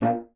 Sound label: bassoon A#2; Xsample VOL-6; C BASSOON